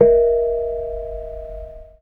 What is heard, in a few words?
KENONG; Spectrasonics; Heart of Asia